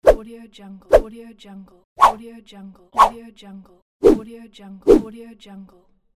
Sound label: wooshs